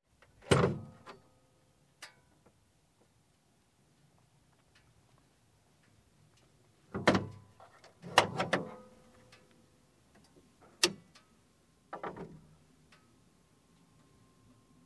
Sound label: Car opening and closing hood